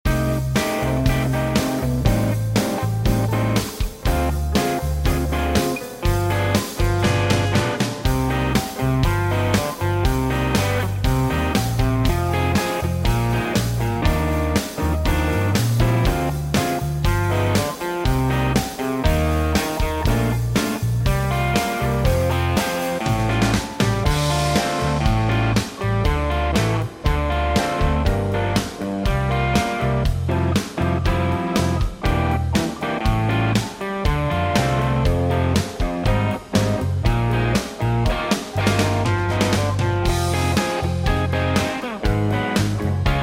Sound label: Instrumental Blues